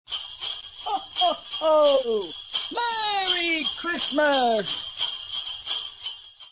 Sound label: xmas ambient; csco; csgo